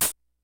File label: FUNK, DRUMS; Kid Nepro; Elite